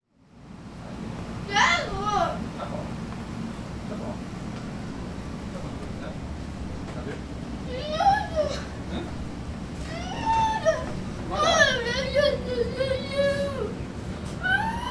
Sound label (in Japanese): suneta koe; すねた声